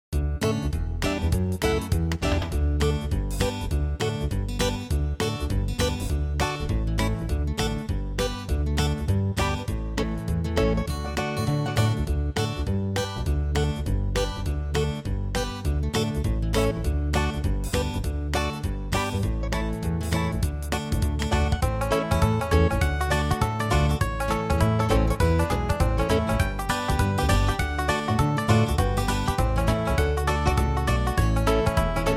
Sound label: hornpipe uptempo, fiddle; fishers hornpipe